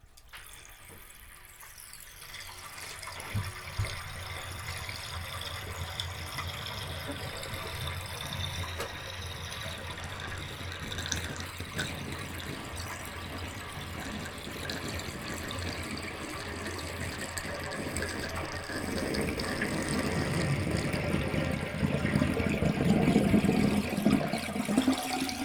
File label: Bath Emptying, water